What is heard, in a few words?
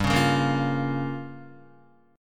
G-Minor 7th Flat 5th, Guitar, Gm7b5 chord; strummed; JGuitar